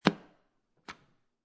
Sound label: Intercom Button Push